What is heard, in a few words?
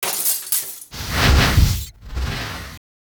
Shatter; Shatter FX